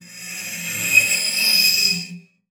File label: spinet; Xsample VOL-7